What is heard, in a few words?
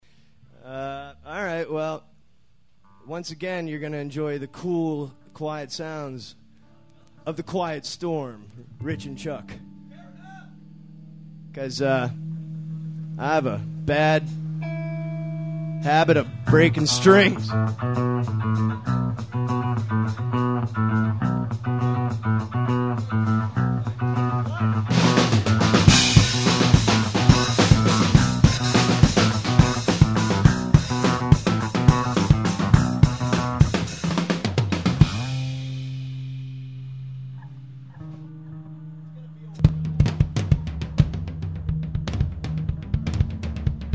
Broken string noise; music; Live; morgantown sound